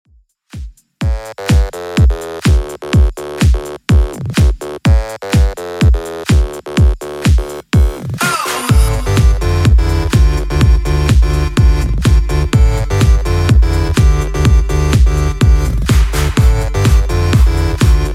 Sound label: 2ks Redrum 125 bpm